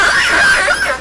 call, Sea Sounds; S33; Osborne ver.

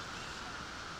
Background Noise

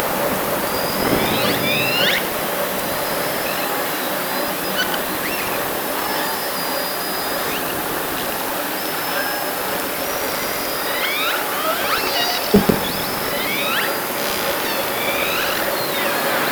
Orca sound Night Passby

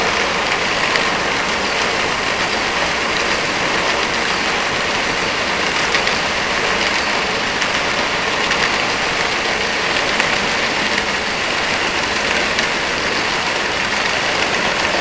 Hydrophones, orcasound

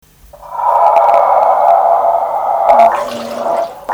hooded seal roar grown female

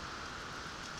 Background Noise

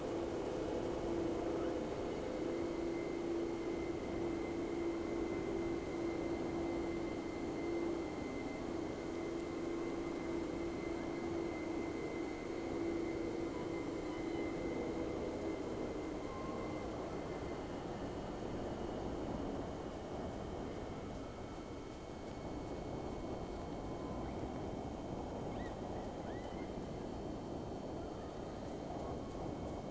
Whales; SeaSnake

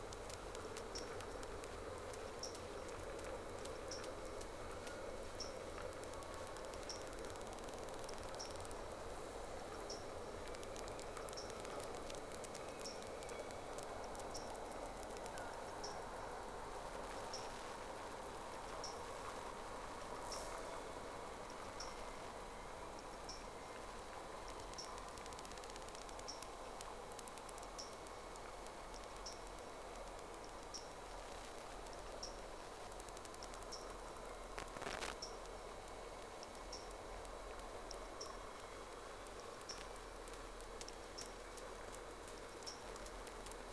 Whales; SeaSnake

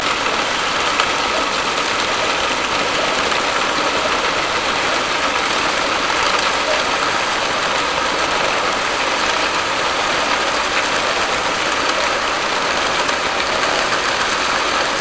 Hydrophones, orcasound

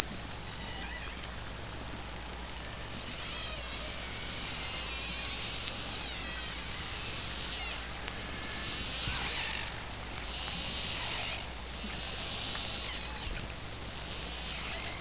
Hydrophones, orcasound

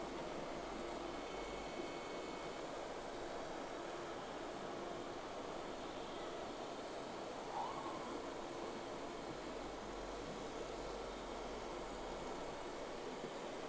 Whales; SeaSnake

